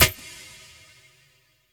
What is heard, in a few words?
SD HOU, Dance Mega Drums; Best Service